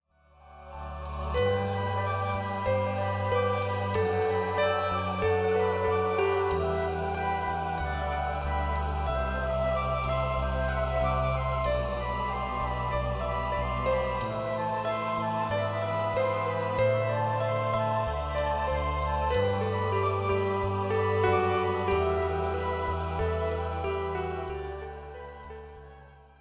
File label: Lullaby